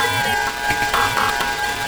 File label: VFX2 Loop 128 BPM